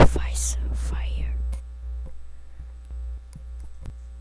fire

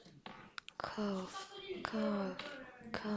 cough

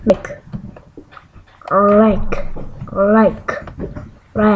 rake

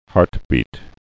heartbeat

